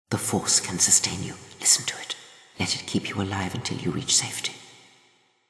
breath, voice; Star Wars Knights Of The Old Republic II; XBOX

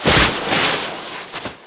foley slide, skater; Tony Hawk's Project 8; XBOX

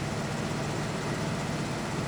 car idle; Soldier Of Fortune II Double Helix; XBOX; ambience

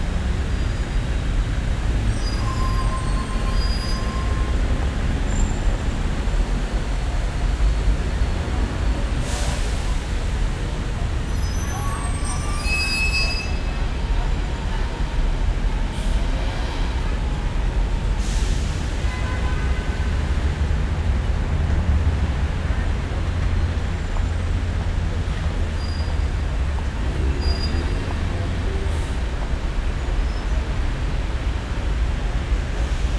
ambience; Street Hoops; XBOX

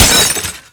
Sweetner Glass, impacts; Totaled; XBOX

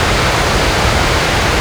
funpark waterfall; Tony Hawk's Project 8; XBOX

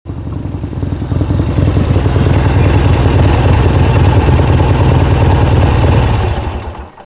harley; Virtual Pool Tournament Edition

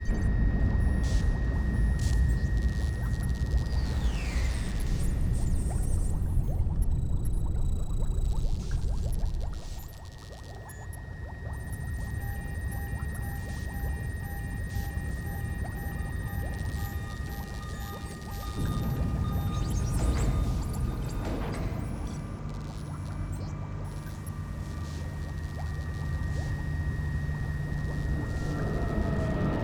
ambient two; X2 Wolverines Revenge

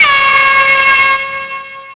Air Horn L; Grand Theft Auto Vice City; XBOX

